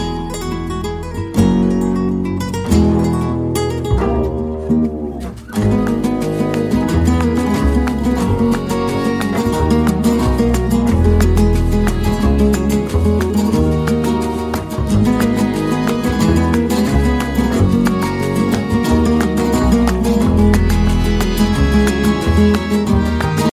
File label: Flamenco